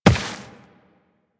Tenno Step Rock, Footsteps, Walk; Warframe

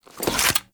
Staff Unequip, Weapons; Warframe

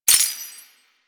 Step Spur; Enemies; Foley; Footsteps; Warframe; Duviri; Dax

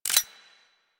Hand Shotgun Fire Switch

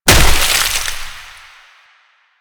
Proc Cold End, Sounds; Mods

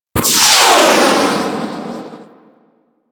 RPG Rocket; Hellion Elite

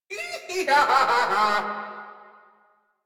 Laugh, Grineer Queens Guards; Warframe

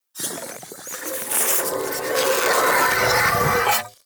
Shrimp Lantern Weapon Charge Up; Creatures; Baliq

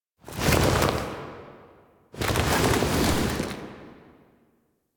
Foley B; Warframe; Quests; The New War; Lotus Gem Part One

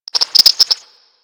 Garden Animal; Warframe; Ambience; Clan Dojo; Dojo Garden Animals

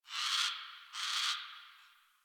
Tree Critters; Warframe; Duviri

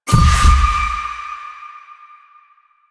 Ring Success; Warframe; Jade Shadows; Quests; Birth Minigame

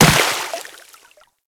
Infested Runner Step Water; Warframe

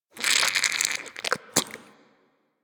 Goop Squeeze Close; Ambience; Warframe; Infested Eris

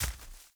Walk Veg, Footsteps; Characters; Enemy; Mockeries

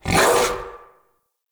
Battle Dog Attack Bark; Animals; Vocals

